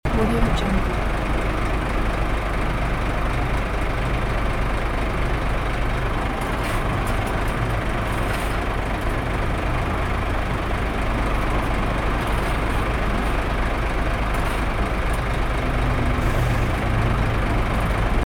truck in traffic jam idling loop; urban sounds

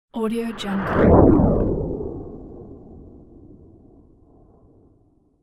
burning comet flyby; futuristic; عبور ستاره دنباله دار

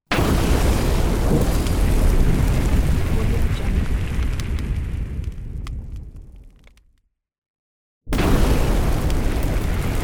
burning text effect; transitions and movement

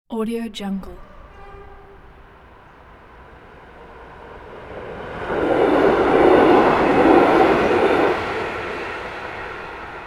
local train fast pass by; urban sounds

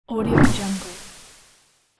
transition muted pops; transitions and movement

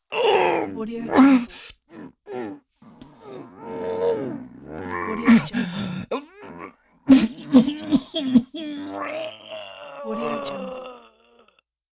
man moaning in pain; مرد در حال ناله; human sounds

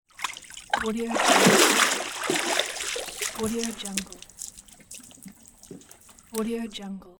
climbing out of exterior pool; بیرون آمدن از آب استخر; nature sounds

